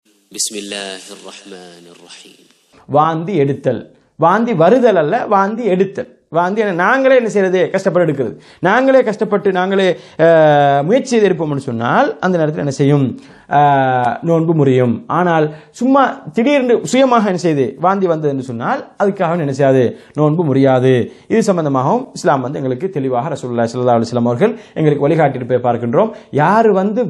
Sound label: vomitting